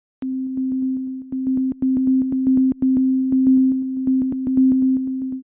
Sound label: Bouncing loop